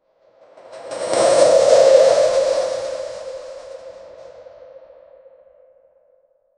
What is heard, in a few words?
swosh